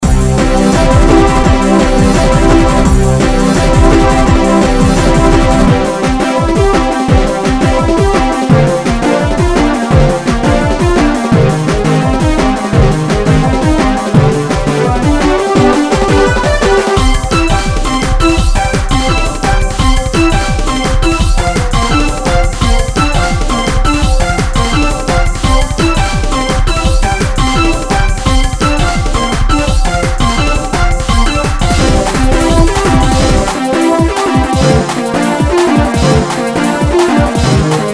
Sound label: trance